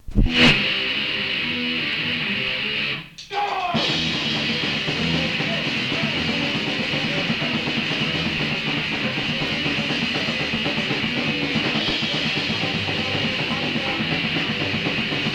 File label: Practice Jam